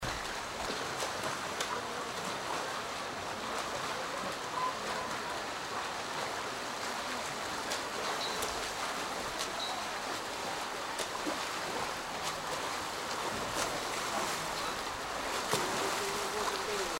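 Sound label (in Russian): водное поло пловцы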